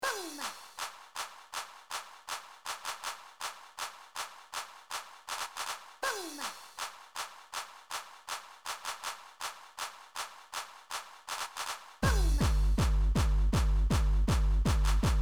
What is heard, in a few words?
bumbass